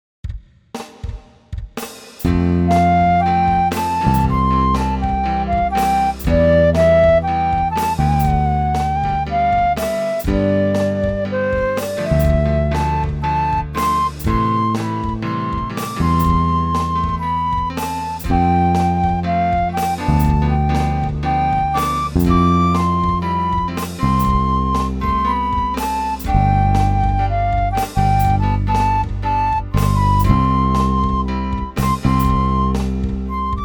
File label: MIDI Ditty; spellman studio